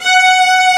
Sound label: STRINX F#4; Analogon